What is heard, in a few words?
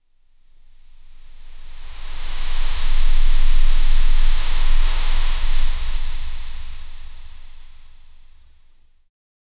light rapid fluttering; fabric